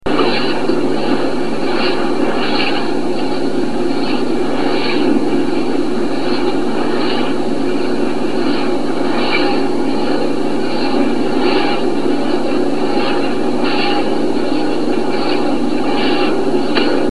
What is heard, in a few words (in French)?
bruit eolienne